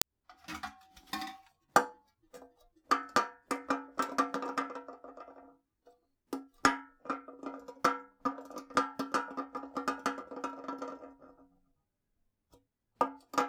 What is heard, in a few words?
Can Crush And Roll, Drinks Can